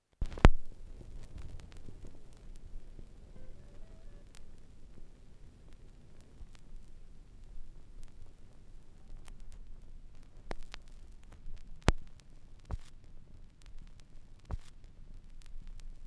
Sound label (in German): schallplattengeraeusche